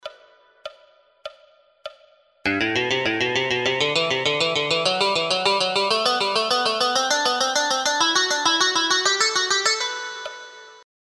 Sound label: SCALES